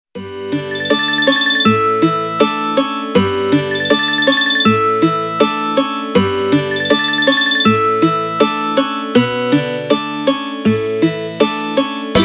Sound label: nokia